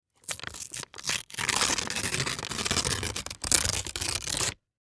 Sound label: Present Tear Open, Present Tear Open Wrapping Paper; Foley; Ripping; Objects; Blastwave